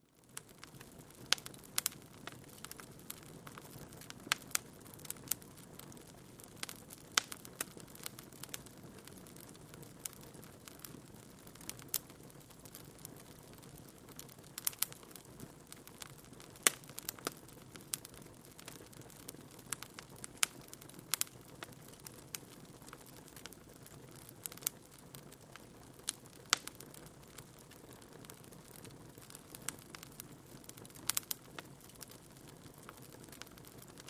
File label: Fireplace